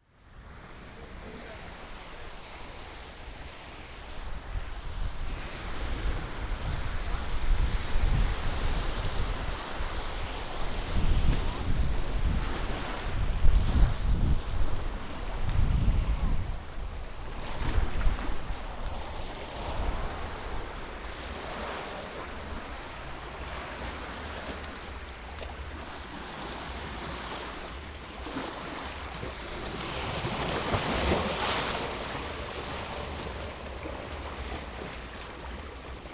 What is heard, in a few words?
waves on rocks night, bahamas; Heaven & Hell in Paradise